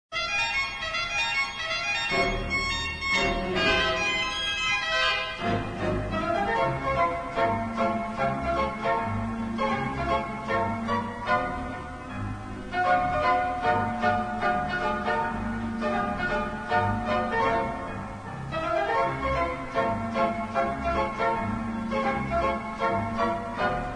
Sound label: theatre organ